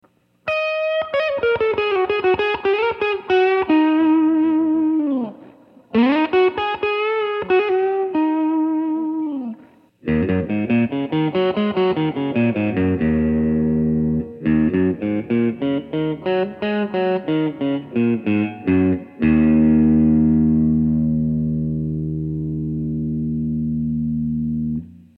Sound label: Strat ECC832